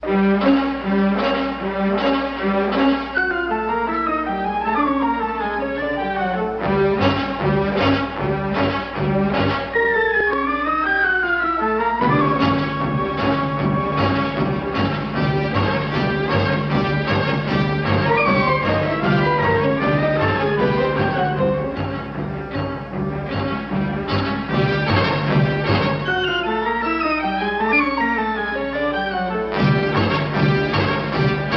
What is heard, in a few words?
closing titles; KRAFT SUSPENSE THEATRE